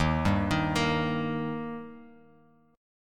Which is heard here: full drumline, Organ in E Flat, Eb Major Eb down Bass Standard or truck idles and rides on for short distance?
Eb Major Eb down Bass Standard